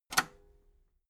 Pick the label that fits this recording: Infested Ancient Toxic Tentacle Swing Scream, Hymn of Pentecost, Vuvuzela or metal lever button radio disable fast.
metal lever button radio disable fast